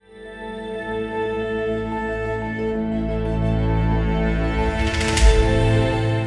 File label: Crystal Drops